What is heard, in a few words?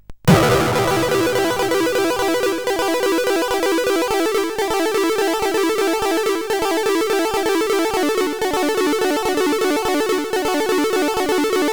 sid CSG8580R5